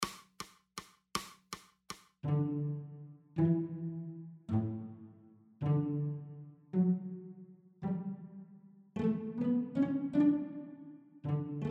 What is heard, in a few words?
cello